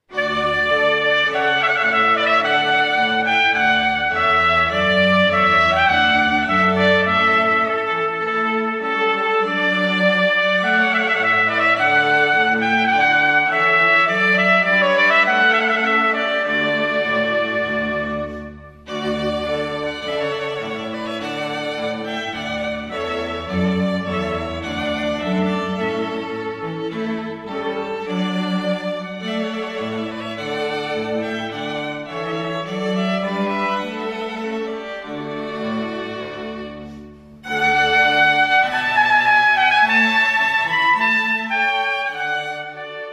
String Quartet w Trumpet

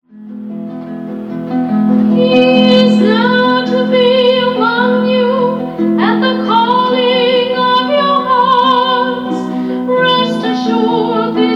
Wedding Song Harp And Soprano

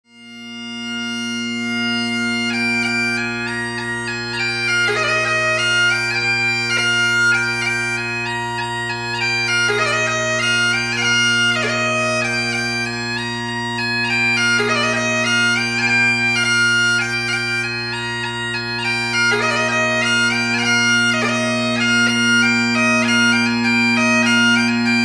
Bagpipes

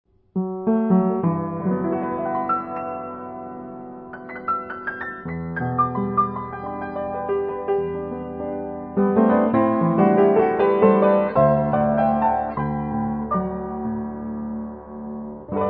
piano

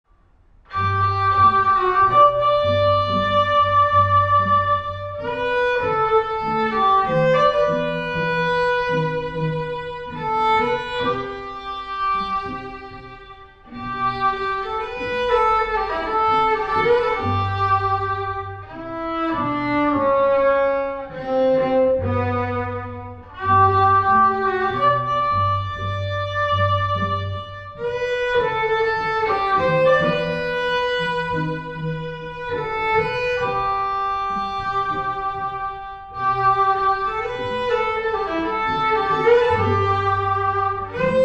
Violin Solo; jazzband

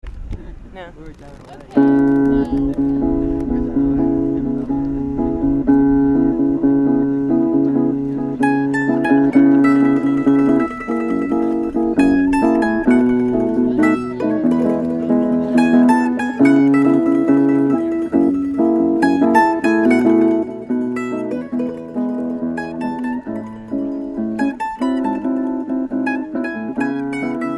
Processional violin guitar mandolin; Catherine Boyd

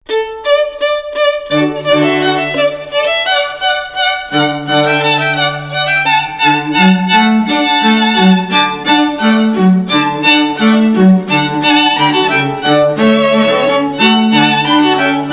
Classical, String Quartet

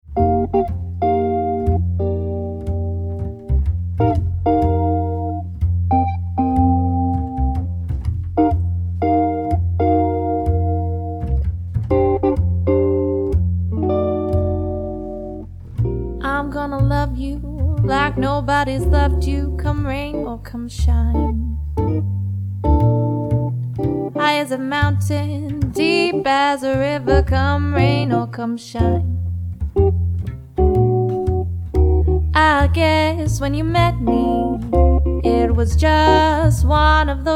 Jazz Standard

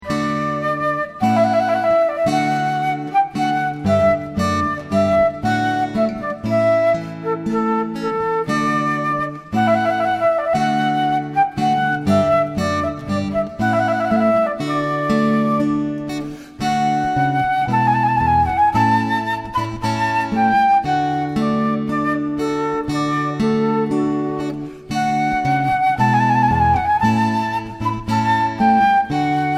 Trumpet; variety band; Trumpet Voluntary

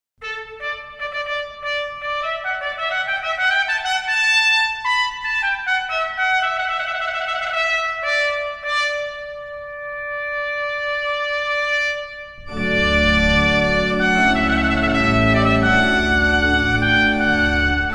Jazz Band

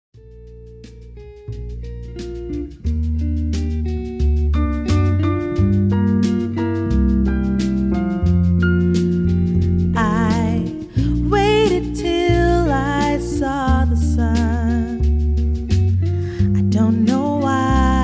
9 Piece Dance Band; jazz band

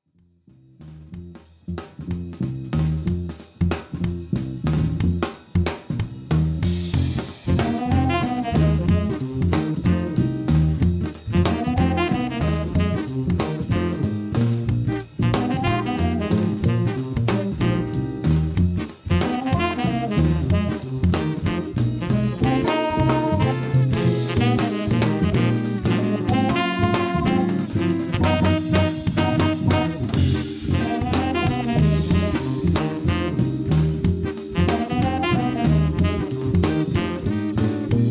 Blue swing; Jazz

